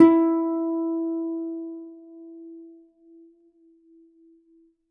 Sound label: E3 harp; Garritan; GigaHarp